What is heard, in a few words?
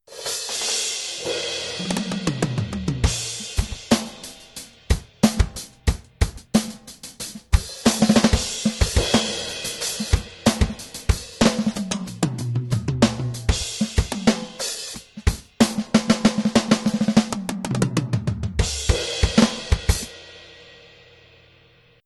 Drumit livekit